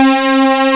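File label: square wave; gravis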